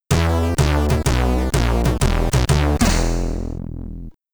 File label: Game Over (SID Stereo); Motley Tetris; Commodore 64